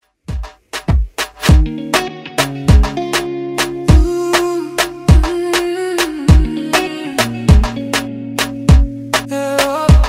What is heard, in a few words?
Afrobeat Redrum